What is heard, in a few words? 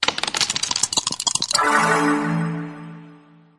Dice; the great ottomans strategy battle for throne android; SFX Pyramid Dice